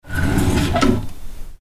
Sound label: Kitchen Drawer Open